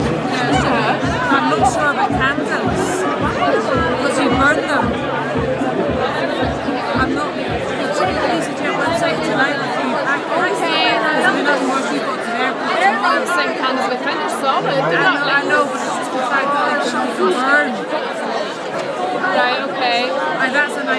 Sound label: udder belly atmos